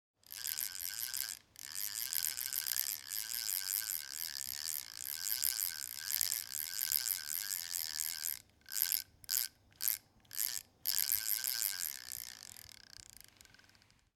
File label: Fishing Reel